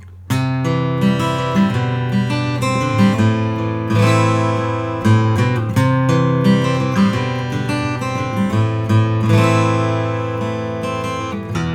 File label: guild custom shop f30k